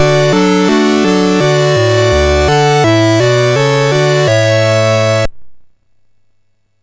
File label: sid MOS6581R4; Passing Shot